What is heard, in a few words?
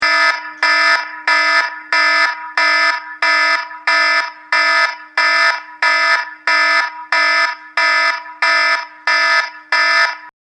Alarm